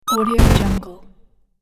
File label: tone sensor to glitch